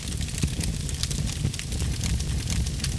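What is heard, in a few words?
fire; ohen; Mafie 1 cz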